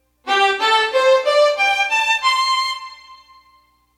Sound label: melody recognition